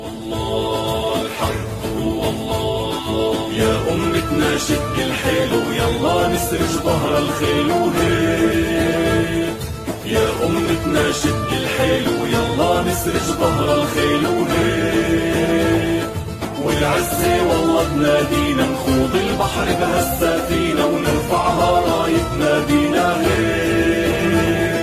Ringtone

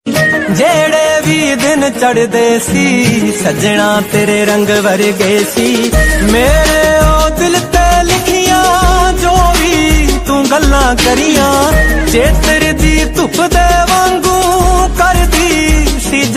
New Punjabi Love Song Ringtone

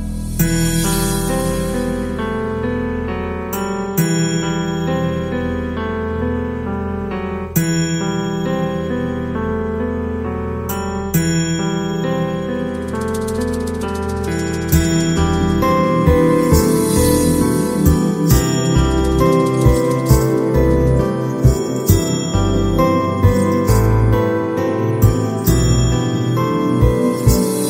Instrumental Tone Ringtone

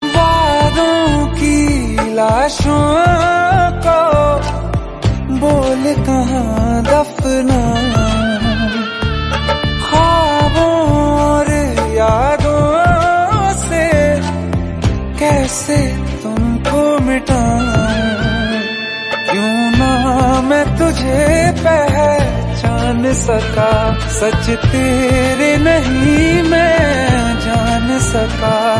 Hindi Sad Song Ringtone

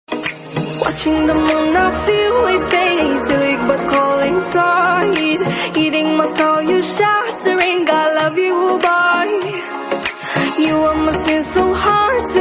Song Ringtone

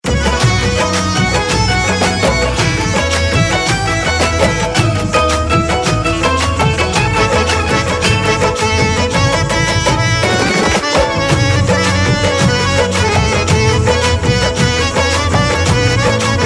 Instrumental Ringtone

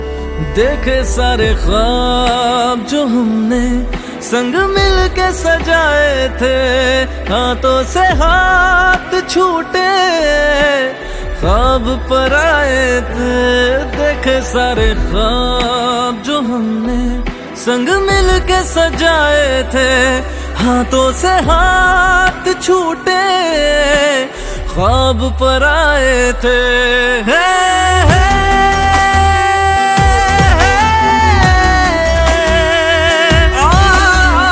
Song Ringtone

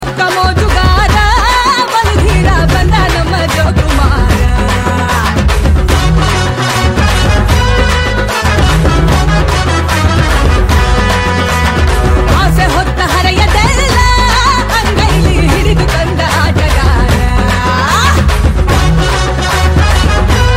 Kannada Ring

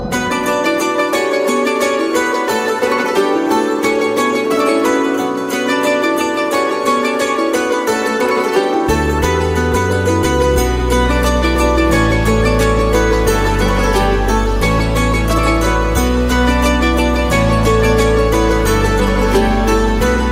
Song Instrumental Tone

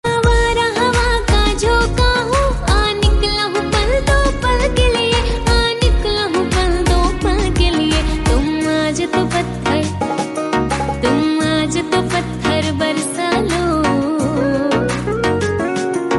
bollywood female ringtone

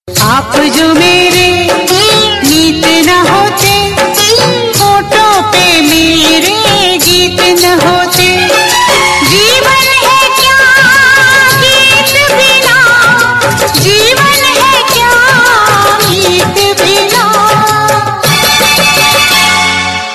Song Ring

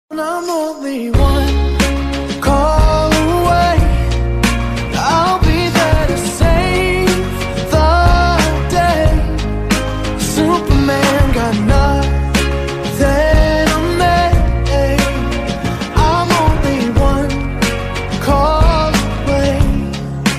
English Ringtone

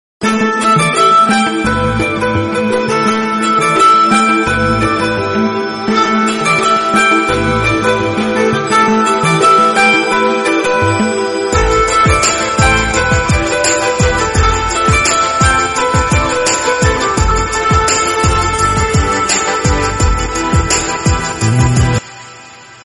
Remixed Instrumental Ringtone; Ghajini

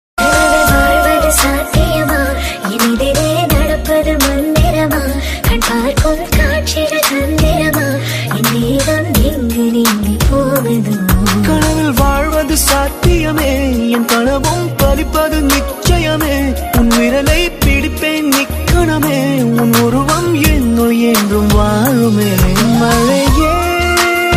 Telugu Love Feeling Female

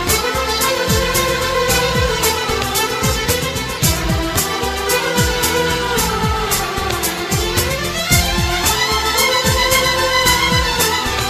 Instrumental Ringtone

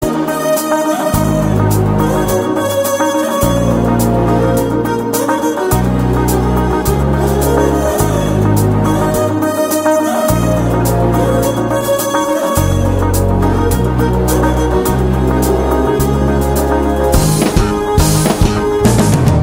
Love BGM

